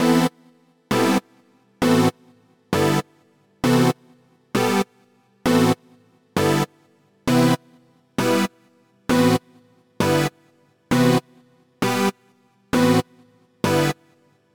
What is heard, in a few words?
Poly Stacks; super crush k.o.